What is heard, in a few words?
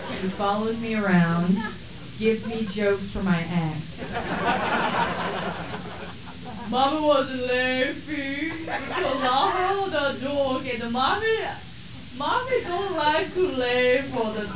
laugh inside; (as her mother) "Mommy likes to laugh on the inside..."